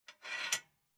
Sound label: Household Old Norwegian Log Wood Burner Metal Air Vents Sliding Closed; Fireplace